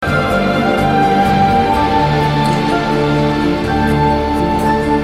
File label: Game Tune